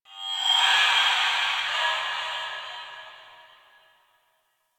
Spirit Chatter, Ambience

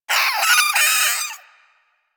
Vocal Death, Hunting Critters; Warframe; Cryptilex

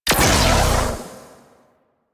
Med Charged Shot B; Warframe; Weapons; Corpus; Vandal

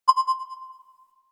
Library Scanner Scan Available, UI; Warframe

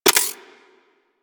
Briefcase Akimbo Pistol Reload Passive; Warframe; Weapons; Corpus

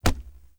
Unarmed Punch Impact Wood Hollow Large

